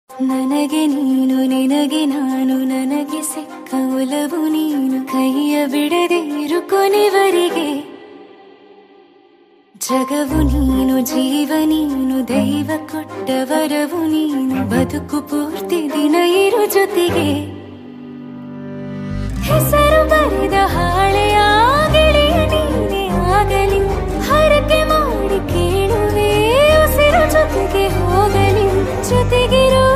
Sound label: song kannada; upadyaksha